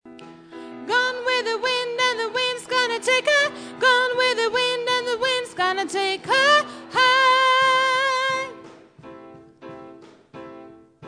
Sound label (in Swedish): sopran